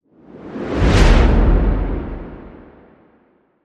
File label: Sled Flyby